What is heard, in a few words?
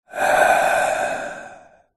human breath out exhale slightly sinister close up; zapsplat